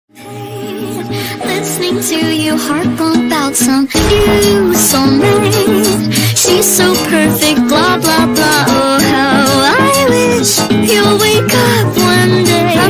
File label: funk slowed reverb